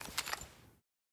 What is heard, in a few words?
revolver insert shells; Rust